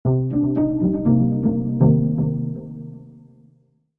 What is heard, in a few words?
jingle; ジングル[シンセ系](7); シンセディレイ系